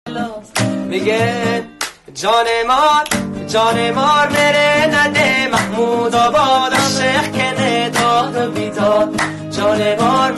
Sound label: music afghani sedaye bache remix